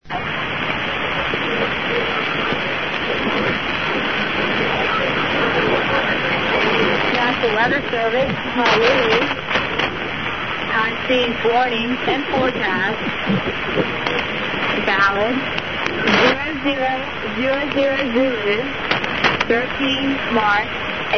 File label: uscg usb; USCG Honolulu; Pacific weather from Honolulu tranmitted via Coast Guard Radio